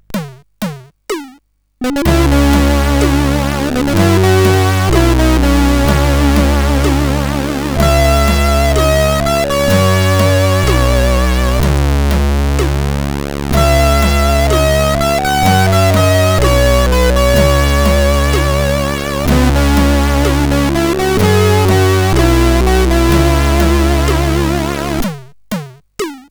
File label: Short Mix sid CSG8580R5